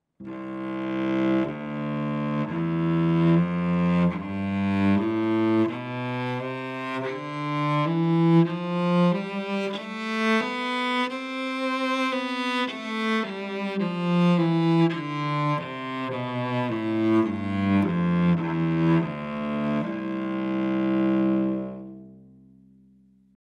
Cello

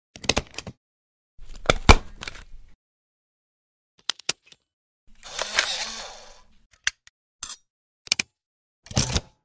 staple

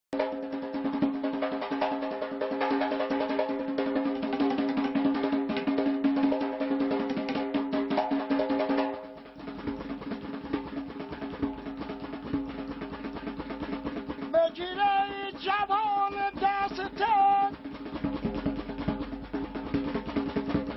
Zarb Zoorkhane

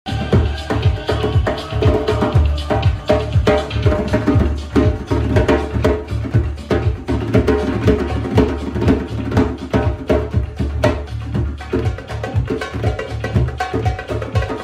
Djembe

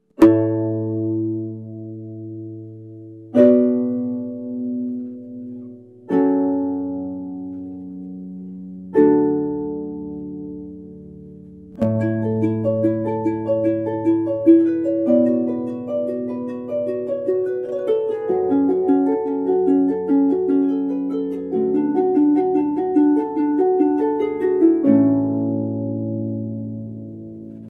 harp

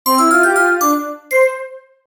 level passed

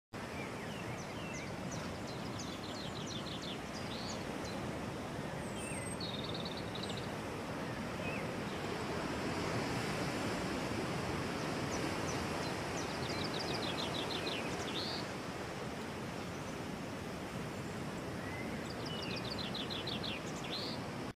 sea and bird

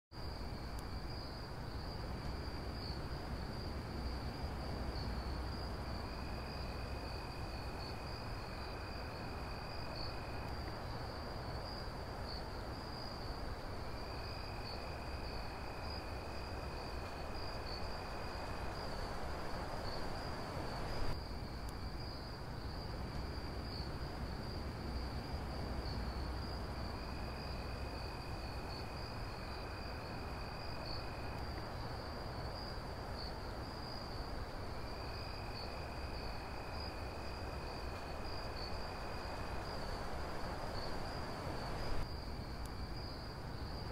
Night

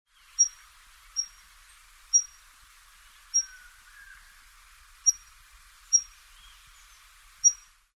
Scaled flowerpiercer; پرنده گل شکاف پولکدار

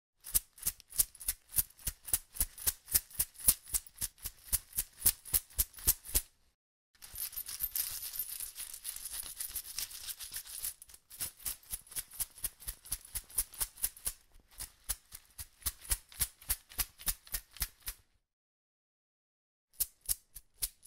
coin bag; کیسه سکه

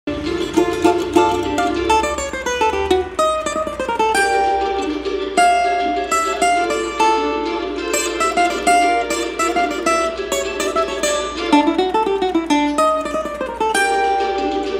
Cavaquinho; کاواکینیو

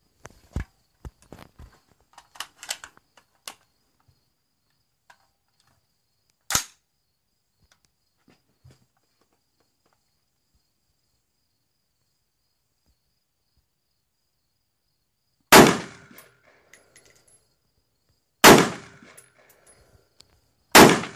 Norinco CQ, اسلحه; نورینکو سی کیو

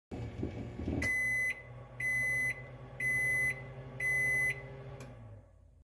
oven bell

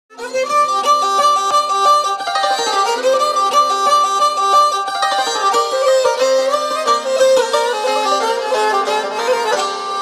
ghaychak; قیچک

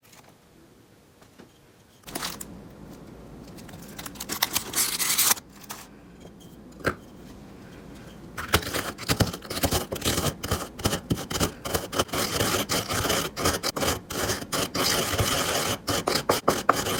biscuit asmr, خرد شدن بیسکویت

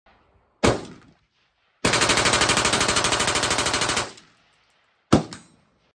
Steyr AUG; اسلحه اشتایر آاوگ

